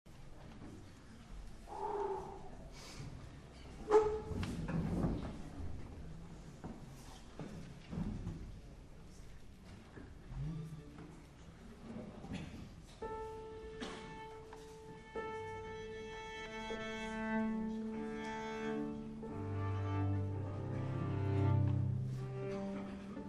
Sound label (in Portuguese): para clarineta, violino, violoncelo e piano; Terra queimada para clarineta, violino, violoncelo e piano (1993); Harry Crowl